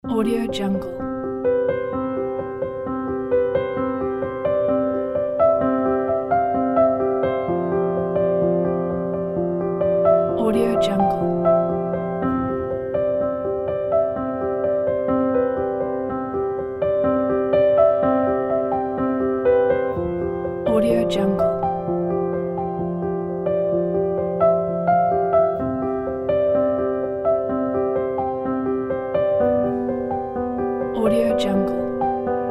relaxation time

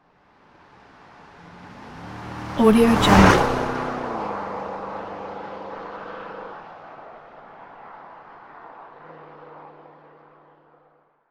camaro pass by at fast speed; sound-ideas

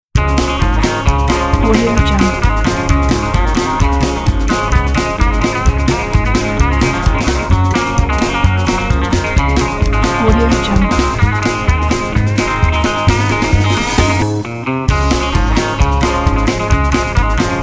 rodeo

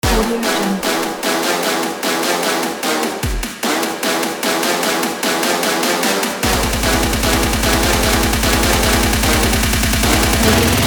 hard sport trap

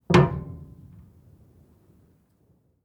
metal hatch

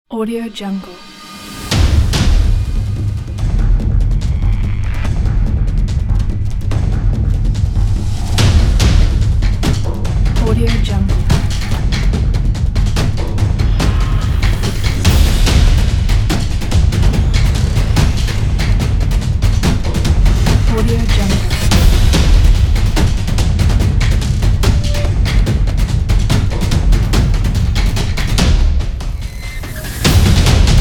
tribal drums

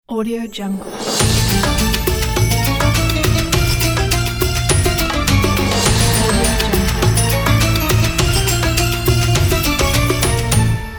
ramadan opener logo

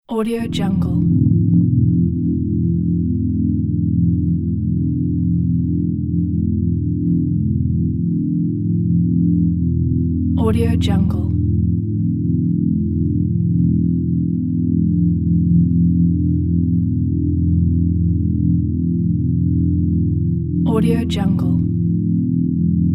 rumble drone

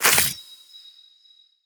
ui unlocks achievment slash echo; esmteam

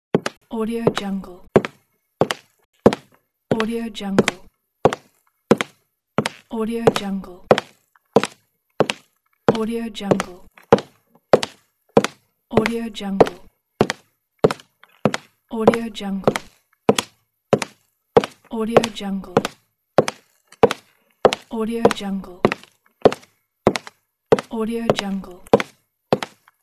walking on wooden floor

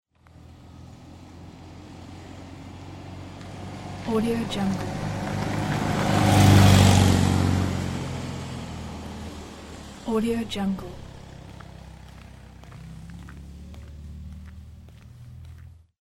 small car driving on field road

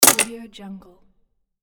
hole puncher; elevaudio

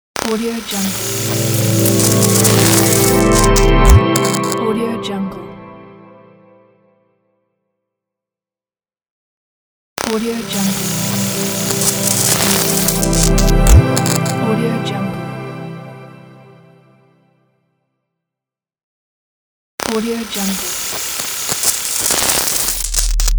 glitch logo

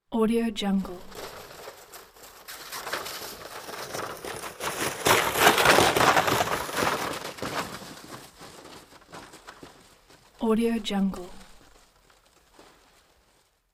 plastic sled pass by

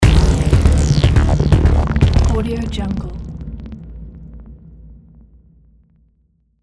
pulsing electric logo

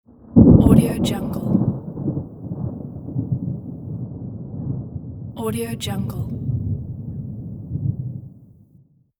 thunder

